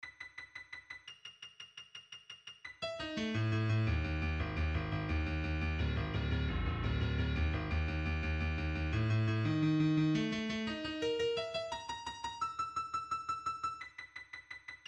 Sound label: maple midi chromatic c 86 bpm